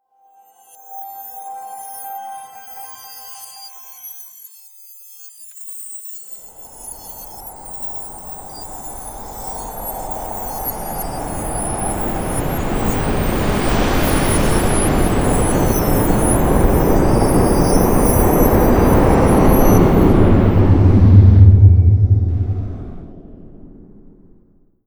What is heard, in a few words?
Rain; Ruins End Symbol Rain Quad